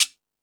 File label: Jungel Percussion, E-MU Pro-Cussion; drum machine